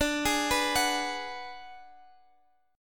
D 6th Flat 5th D down Guitar Half Step Down, chord